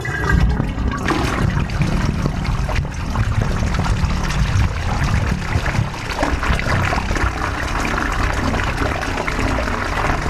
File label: toilet, Sanitation Pipes; SFX